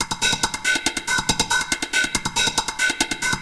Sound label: drum tech